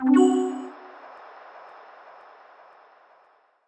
reel spin; fruit shop christmas

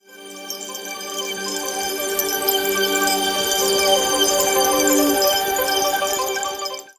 Winning Symbols Ramp Up; dazzle

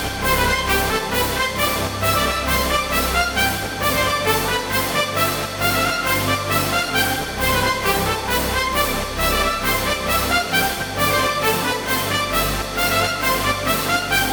super Mega Win Loop; strollingstaxx

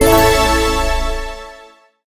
win medium drop; dazzle

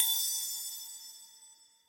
count Up End; parthenon

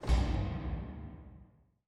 Reel Stop; godsoffortune

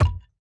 quick Stop; wilderland

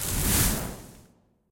multiplier Wheel Outro; arcane

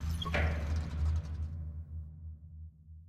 manager End; fairymirror

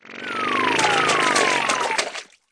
duck Landing Anim; scruffy duck

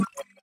start Free Spin Click; jingle spin

